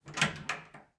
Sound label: door open; Doors and Floors